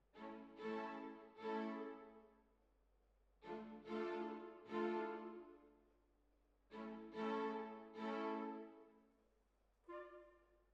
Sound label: Hall, Jazz for piano